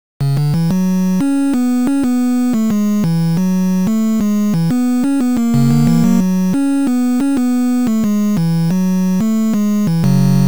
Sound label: Melody